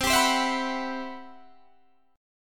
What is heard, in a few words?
C Major Sharp 11th, Guitar Standard; CM#11 strummed